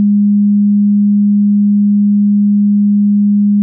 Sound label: bell sounds